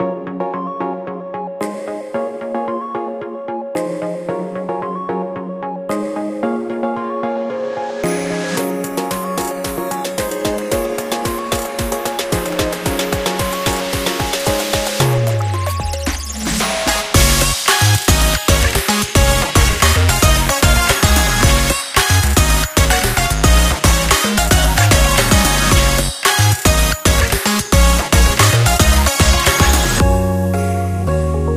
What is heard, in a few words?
Theme; TuanTuan-Engine; GameRes